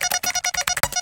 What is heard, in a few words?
code, computer; Cosmic Rage; The Experience-light; ships